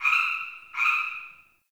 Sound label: Cave Ocean Creature Close; Midrath Island